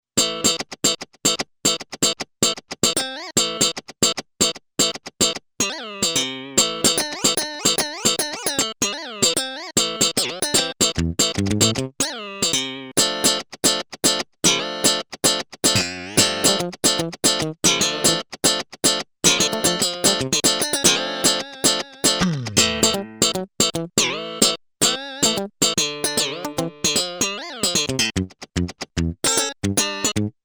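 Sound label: Funk Guitar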